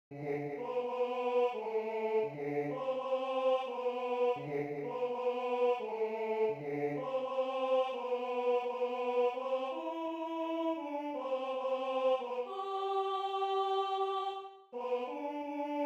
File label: Tenor I